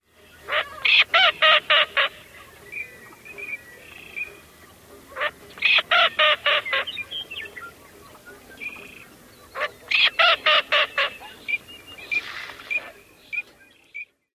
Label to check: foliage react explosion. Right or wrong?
Wrong